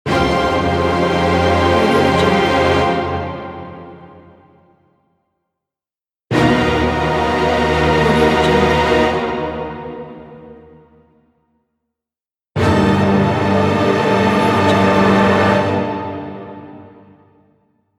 defeat; rawaudiolab

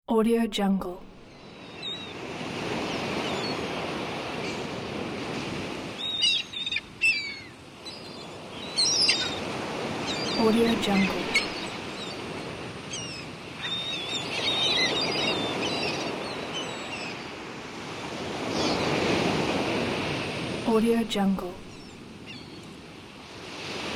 ocean shore

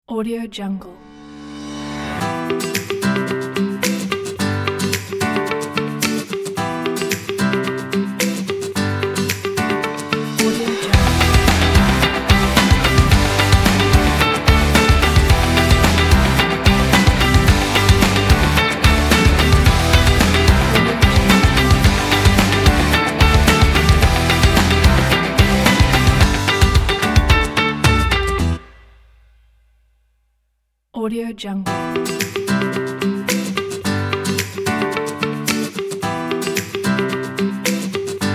upbeat energetic summer pop music kit; alexguz

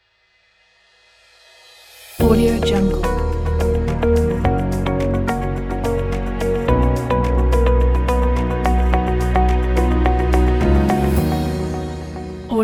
intro ident

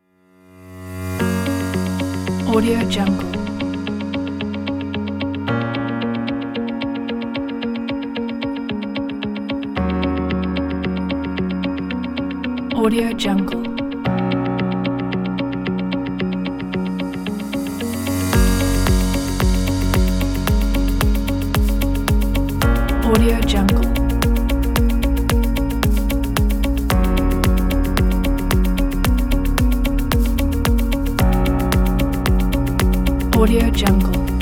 corporate business motivation pack

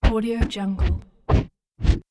whooshes

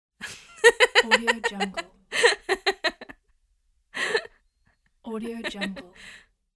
female laugh

